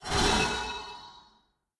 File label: projectile; Smite; Tiamat